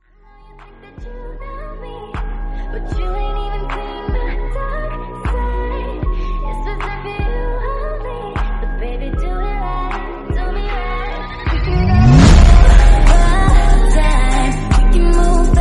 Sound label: english remix